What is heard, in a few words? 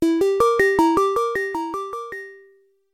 Reminder; Gauntlet